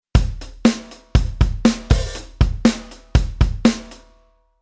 Rhythm 8beat

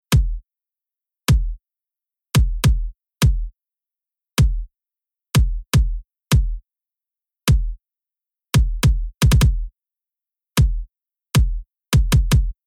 FutureBass Mix Rhythm Bass Kick EQ On

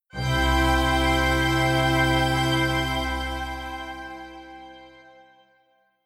Strings All

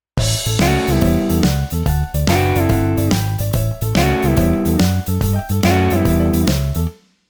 guitar pattern

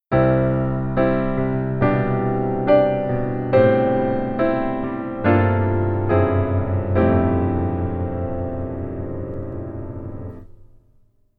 Keyboard

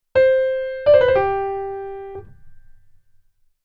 Keyboard Turn

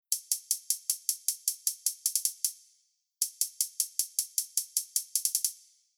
HH Comp On Close; EQ Comp Basic

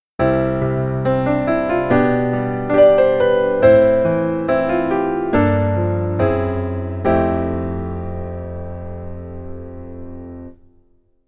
keyboard top note

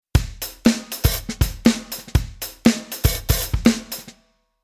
Rhythm Funk

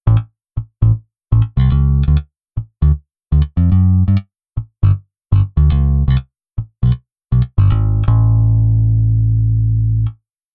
bass legato

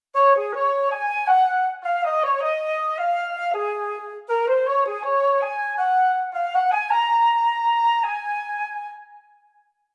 Fl breath

